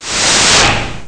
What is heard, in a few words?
spin